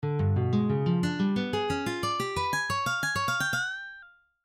G7 Tapping Exercise; Tapping Licks

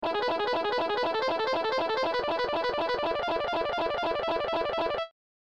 hammer & Pulling

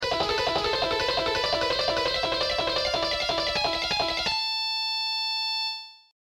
Shredding Exercise

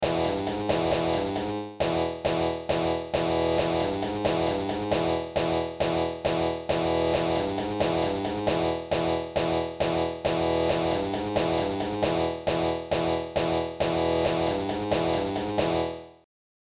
Intro riff

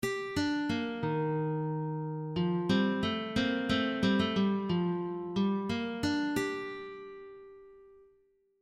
Jingle

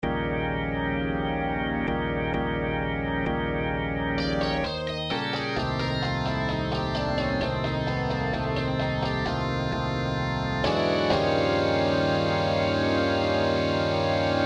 BLUES

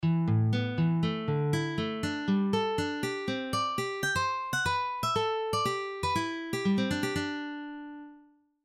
A minor Tapping Exercise; Tapping Licks

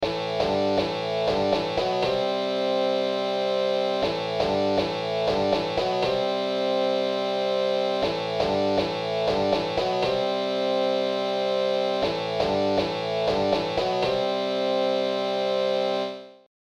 riff

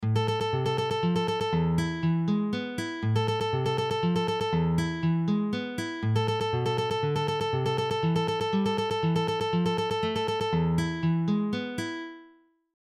Simple Tremolo Exercise; guitar player licks and lessons

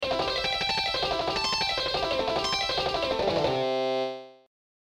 Sweeping & Tapping Exercise; Sweep Excercises

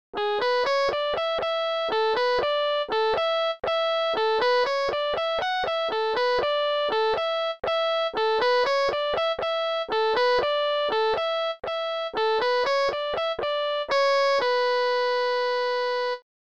Solo

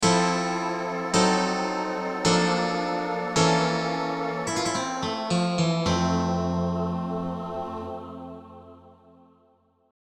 A JAZZY TURNAROUND

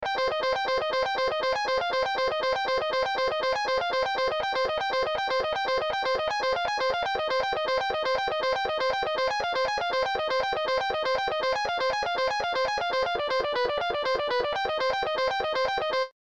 Tapping

